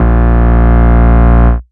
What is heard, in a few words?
Root A#, Ultimate Bass; Vengeance Samples